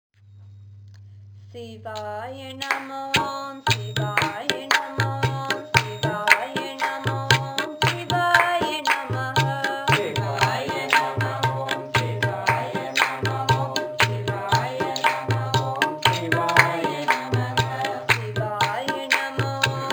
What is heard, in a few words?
Chorus Bhajan Song; Babaji; Sivaya Nama Om